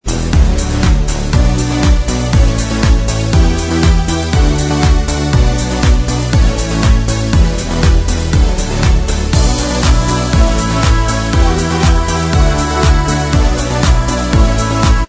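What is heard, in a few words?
instrumental track, backing tracks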